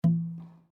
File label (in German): Zupfen an Bespannung